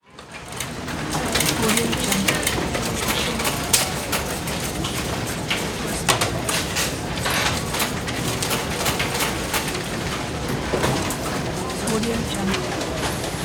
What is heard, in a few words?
office ambience